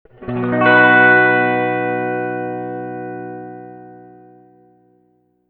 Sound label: reverb tank 3 spring medium sustained chord